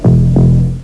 Bom Bom

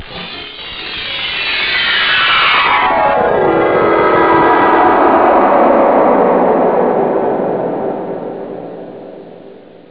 intodeep, effects

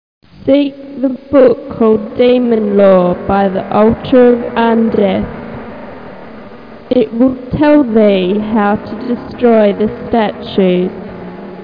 mouth

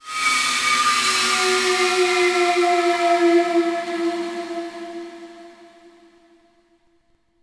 ice; DAoC

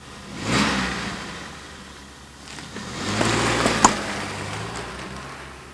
start, cars; transpo

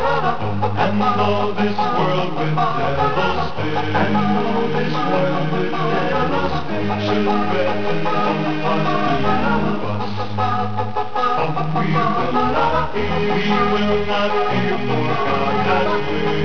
accapelo

